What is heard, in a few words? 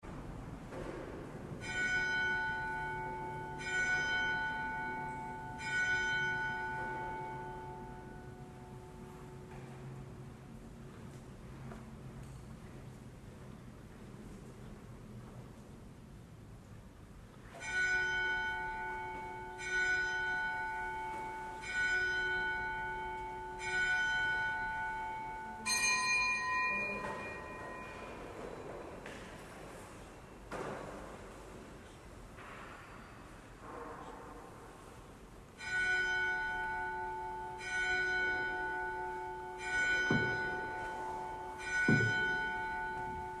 angelus and mass bell